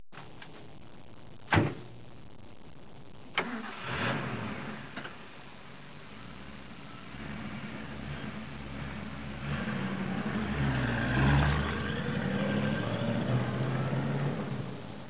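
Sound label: TRAFFIC, car sounds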